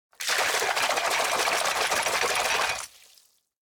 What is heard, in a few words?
duckboat row desperate; anemoi